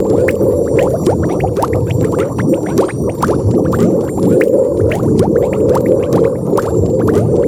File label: drunken rage loop; LoL; gragas